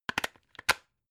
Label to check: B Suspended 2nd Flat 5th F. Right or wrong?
Wrong